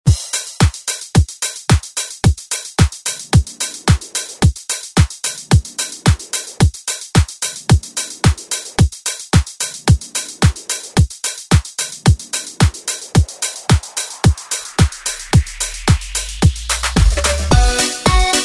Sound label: 90s ReDrum